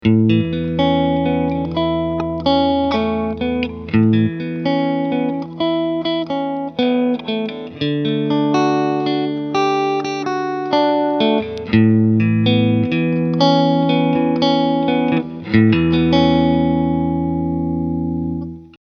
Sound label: Chord 1 Dry